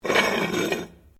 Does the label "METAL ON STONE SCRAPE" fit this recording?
Yes